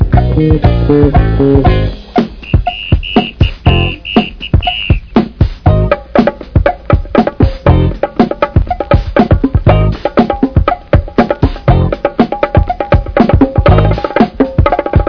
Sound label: Old Funk Music